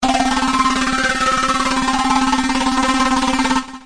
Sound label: superstrummer whistle, Synth